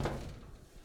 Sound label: Submarine Step